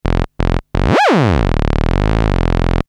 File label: Schranzer